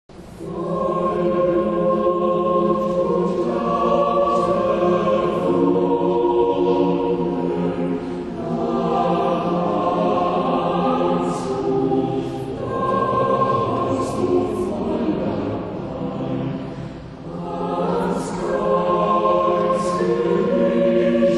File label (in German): Bachchor